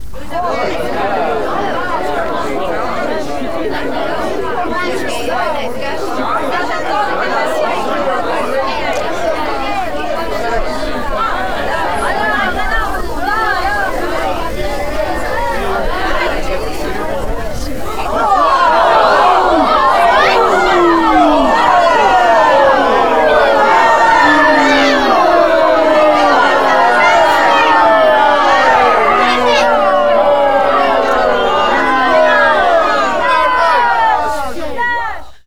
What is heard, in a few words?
Crowd Angry Boos; Vocals & Wallas